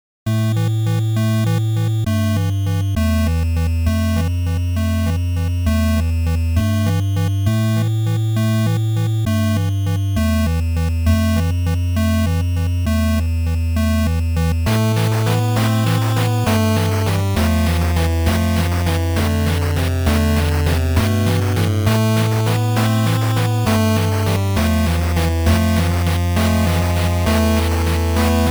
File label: 8-bit; Lenna's Inception; Dreary Dungeon